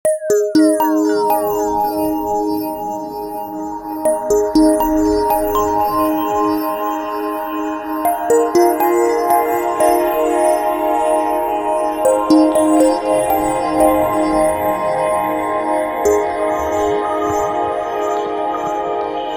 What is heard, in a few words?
teatone